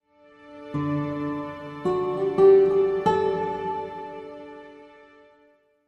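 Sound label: Music Logo Classical Guitar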